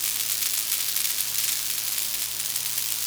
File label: Sparks Loop; moul